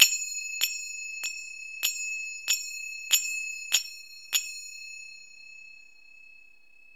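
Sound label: CYMBAL RHYTHM; DRUMS & PERC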